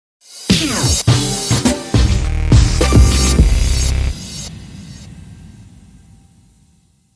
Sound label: G Strings, Sting